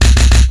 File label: heavy punch; attack